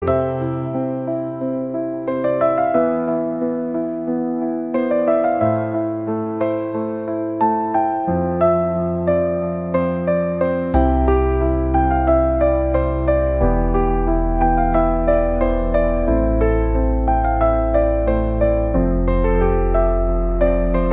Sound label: Urban Music Soiree, bgm